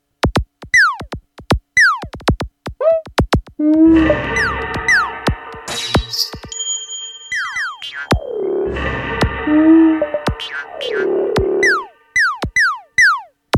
Millenium NonaPad Monster Kit

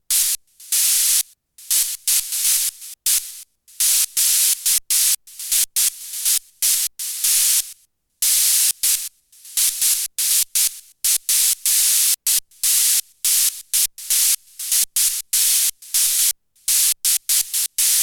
Dreadbox Chromatic Module Gate Spikes HH

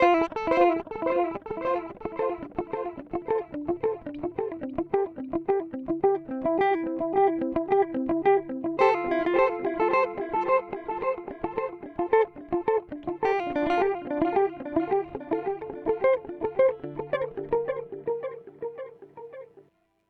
Amplitube X-Time Space Echo

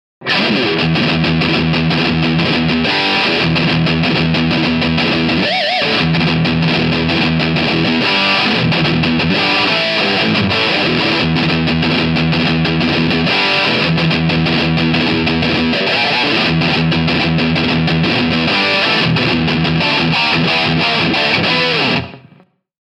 Bridge High Gain

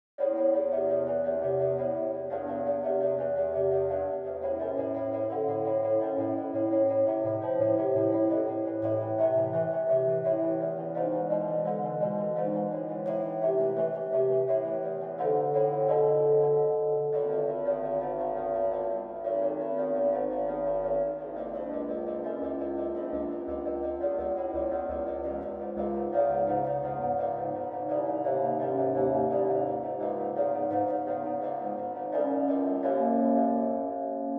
Synthetic

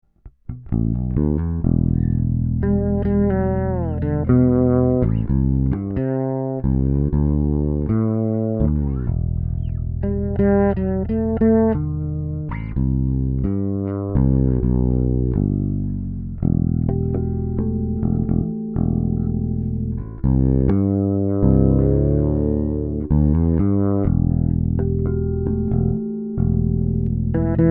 MXR 83 Fretless

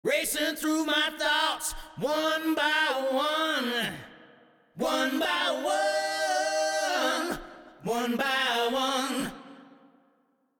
reverb loop Reverb Plate; Arturia; 140

